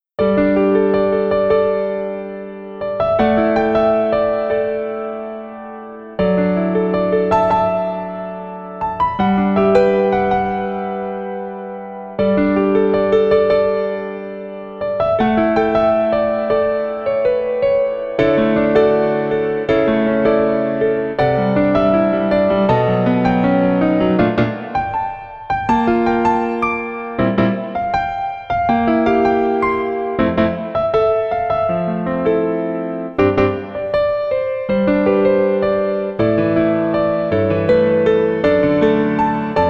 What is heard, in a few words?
Piano Ver.; Primal; primal hearts character song album 2014